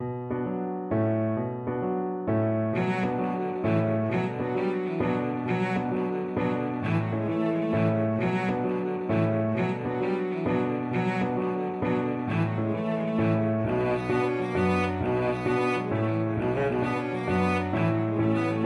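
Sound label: violoncello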